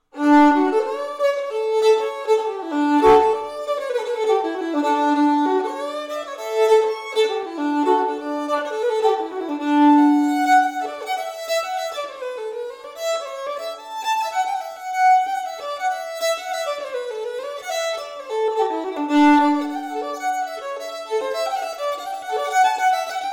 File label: Solo fiddle reels